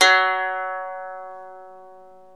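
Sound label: ethnic plucks; Eth Plucks, Pluck Duo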